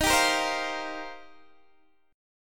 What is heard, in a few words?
Eb 6th Add 9th, Guitar, DADGAD; x,18,17,15,15,15